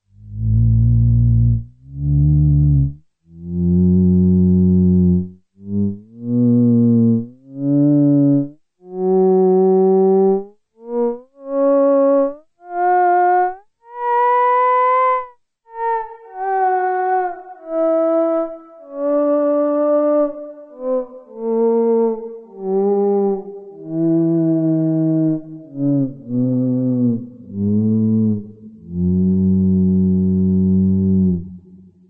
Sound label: theremin